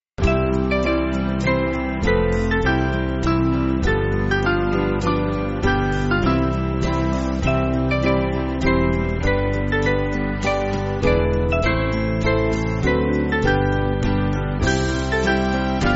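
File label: Band, Backing Files for Churches; Abba Father; Haydn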